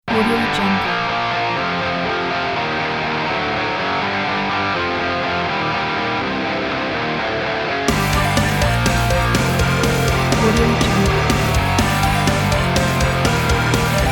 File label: fun video game heavy metal